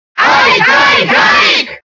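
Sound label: Cheer Italian, crowd; Ike; SSBB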